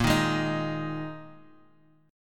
A sharp 7th Suspended 4th down; strummed; JGuitar

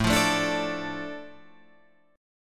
A Suspended 4th Sharp 5th, Guitar Drop D

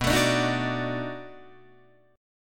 B 7th Sharp 9th, down, Guitar, Open D

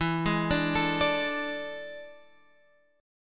E 11th; E11 Chord {12 11 12 X 10 10} arpeggiated

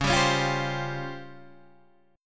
Db Major 7th Flat 5th, Guitar DADGAD

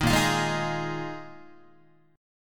A sharp 7th Suspended 4th, Guitar; strummed; JGuitar

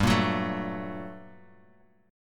G Minor Major 9th, down; strummed; JGuitar

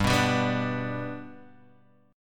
G Major, Guitar, Standard; G strummed; JGuitar

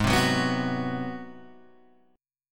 Ab 7th Sharp 9th Guitar Open G, chord

